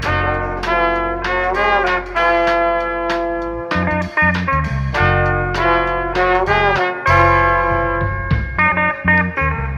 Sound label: horns guitar, phonky loops